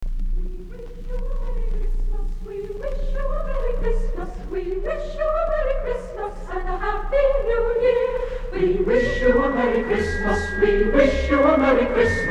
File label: Orchestra & Chorus; We Wish You A Merry Christmas